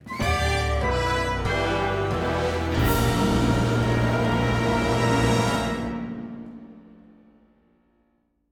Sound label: Game Over; Capcom Fighting Collection 2; PS4, Switch, Windows, Xbox One